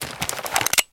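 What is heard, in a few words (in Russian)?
отчетливый треск перезарядки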